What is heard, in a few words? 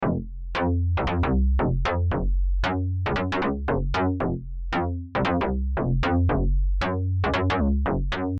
Bass